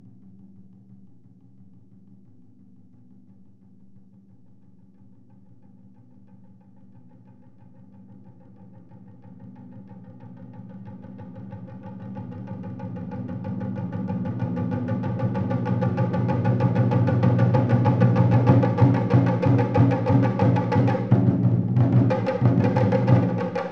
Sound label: Kodo Drum Crescendo